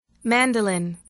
mandolin

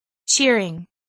cheering

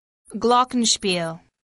glockenspiel

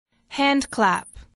handclap

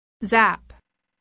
zap

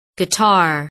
guitar

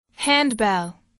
handbell

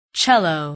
cello

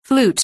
flute

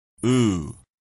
ooh

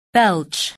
belch

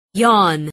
yawn

